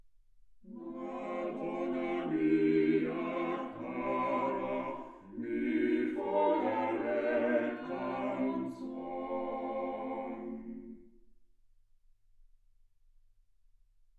quartet

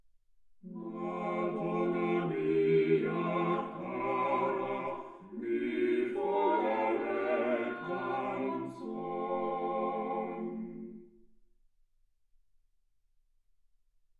quartet

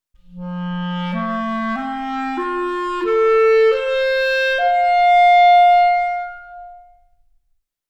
clarinet

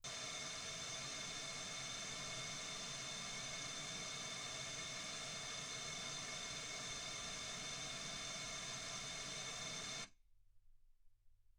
wgn

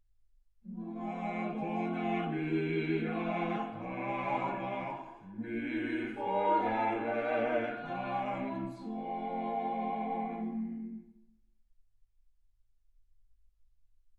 quartet

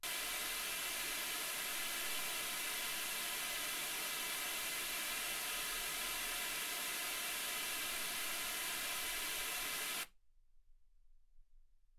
wgn

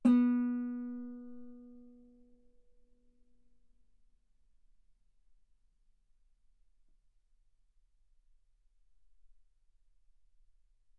Guitar ff sul B B3

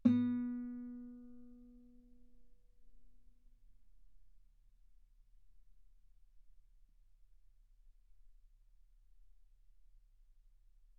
Guitar ff B3; sul B

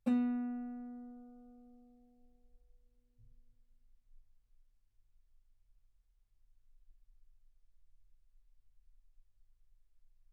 Guitar ff B3; sul B

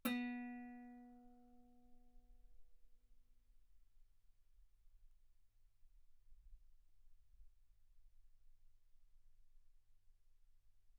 Guitar ff sul B B3; single mic

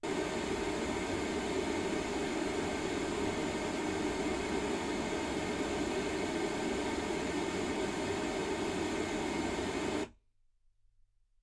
pink noise 48kHz

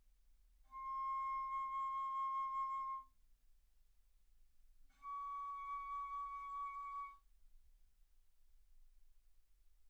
Bass Flute C6 Db6

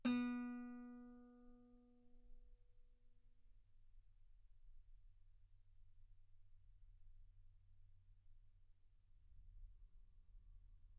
Guitar ff sul B B3

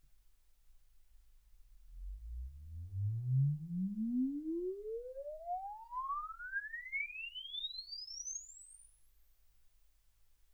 exp swept sinus 10Hz 24kHz

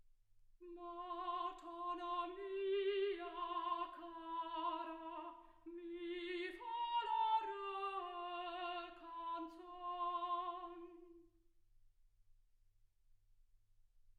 soprano

